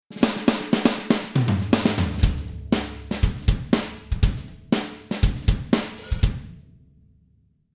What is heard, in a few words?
ghost, drum